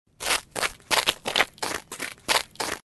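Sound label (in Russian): zvuk bega po ldu, sound of running on ice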